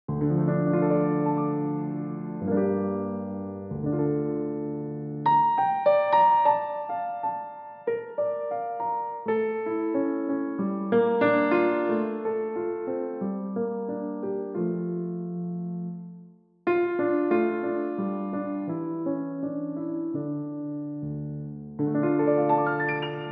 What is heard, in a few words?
Piano Only